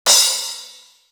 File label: Cymbal, Drum One Shots; AMG - Coldcut's Kleptomania VOL-1